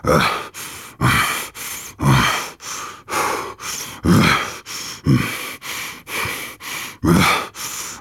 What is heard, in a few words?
Breath Loop; Quests; Veilbreaker; Kahl Abilities